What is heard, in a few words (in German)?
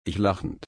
lachen